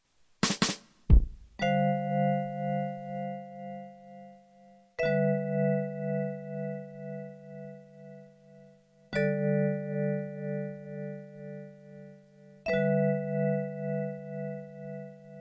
Instrumental Back Half

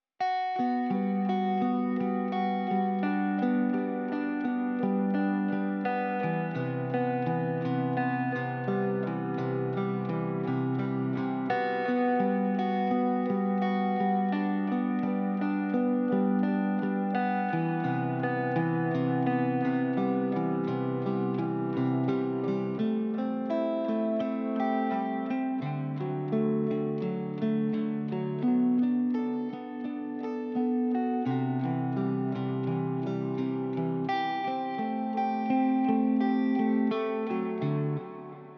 Guitar

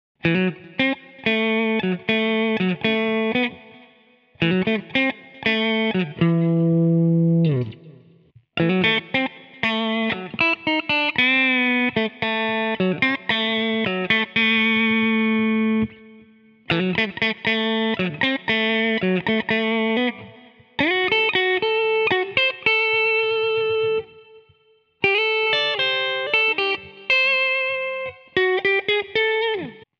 Blue Funk

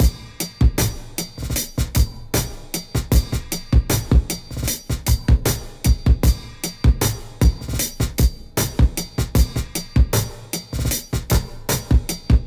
4bar 77

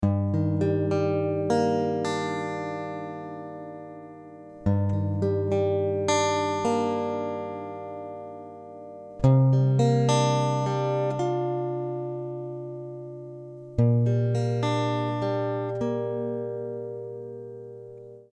Gm 105 sad guitar impro

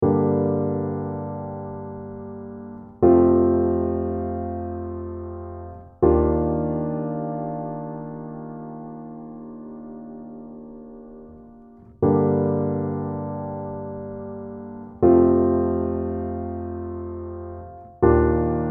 instrumental

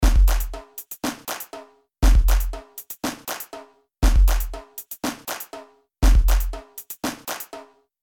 Sample loops

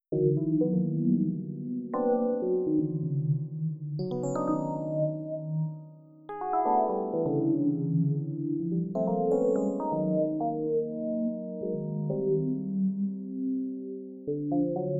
epiano; EPhemeral2

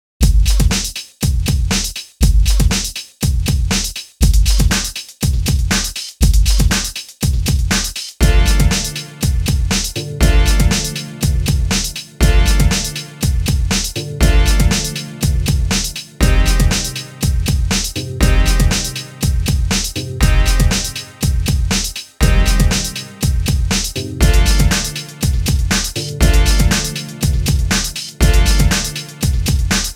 Techno R B Love in Gm

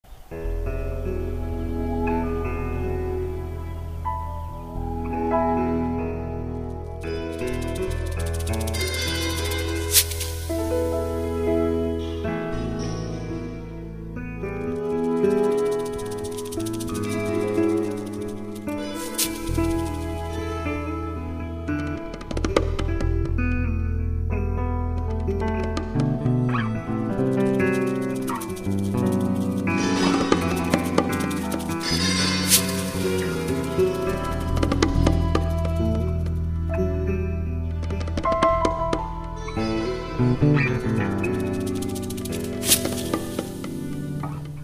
Orchestra Tuning; Moonlight; admiralbob77

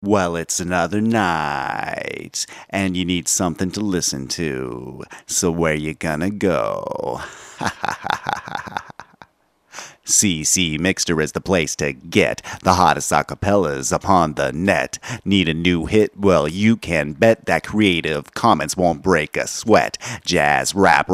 Rap 95 BPM

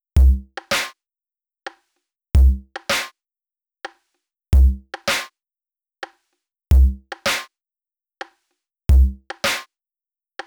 drums

